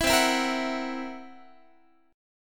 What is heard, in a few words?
C 7th Flat 5th C down Guitar; C7b5 strummed; 19,20,19,20,22,x